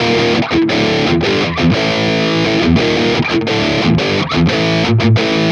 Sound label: Metal Guitar Loop, 174 BPM A Maj; Cymatics; Vibrations Guitar Sample Pack